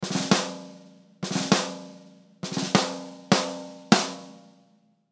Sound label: Drum Snare March; Drum Kits; Blastwave